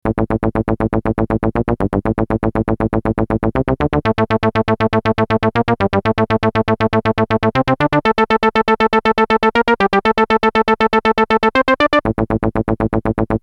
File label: synth